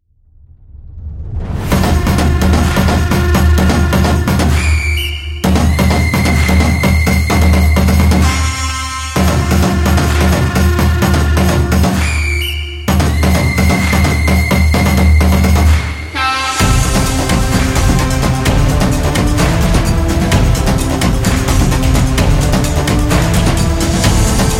Energetic Football Drums

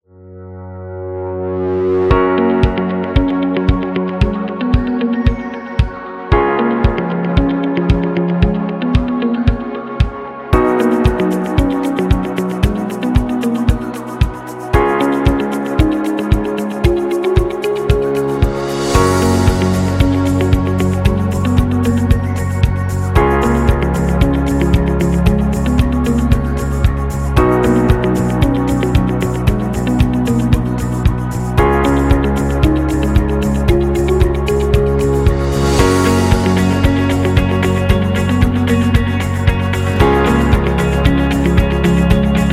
Success Ambient

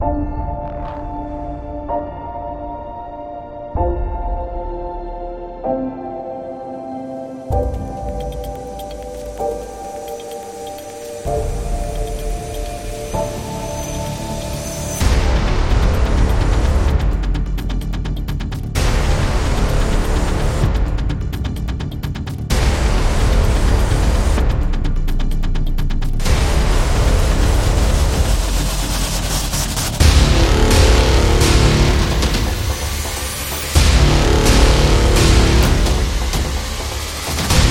Action Teaser

